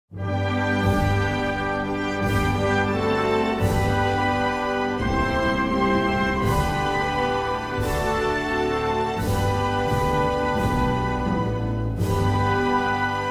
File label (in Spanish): Himno Nacional de Islas Salomón